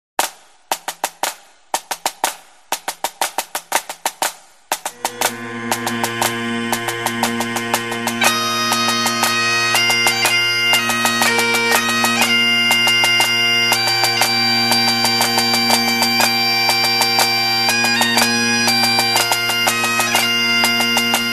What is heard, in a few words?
Kilted Music; HIGHLANDER